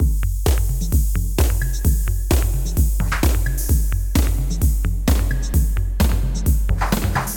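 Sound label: Ride long to short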